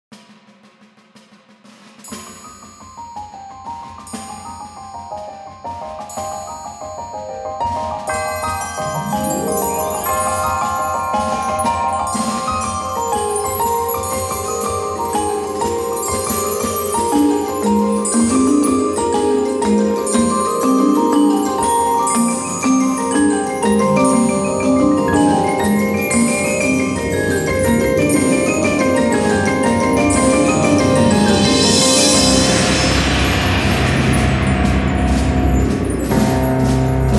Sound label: Percussion & More; Special Edition Vol 1